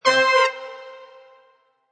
Horns End A# 110, Horn riff in A# at 110bpm; Brass Loops

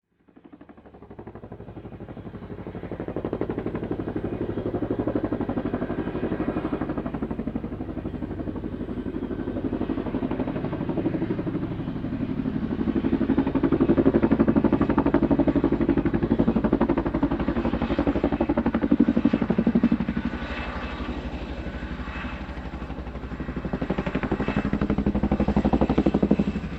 Vehicle Helicopter Pass By Military; Helicopters; Vehicles

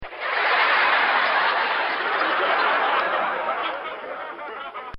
Laugh Tracks; Human

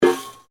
METAL PIPE IMPACT; Metal Sound Effects; Metal (Sound Effects:Materials)

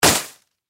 Snowball Impact Hit; Ice; Weather; Ice Sound Effects; Blastwave

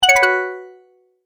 Multimedia Tone-Return/Back; Sound Design; McKinney Sound

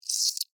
Animal, Beetle, Chirp, Vocalization, Insect, Bug; Beetles; BlastWave

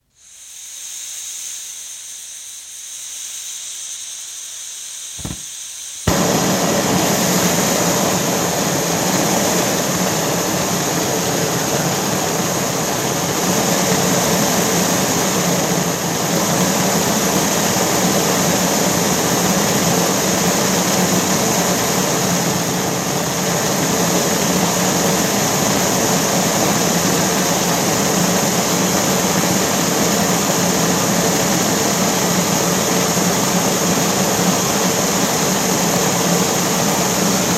Gas blowlamp lit, constant roar of flame, off; Lamps; BBC

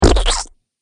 Mud Splat

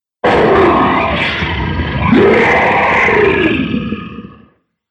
Demon Of Distortion, Monster Sound Effects

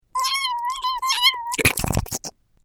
Cartoon Gremlin Hungry Eating, cartoon voice

